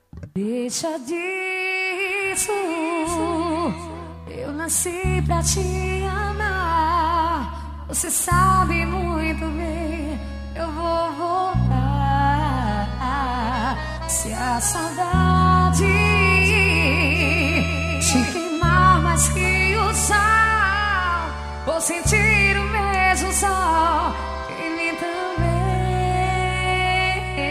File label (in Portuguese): forró real